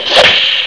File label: Smack; The Ultimate Warrior 2.01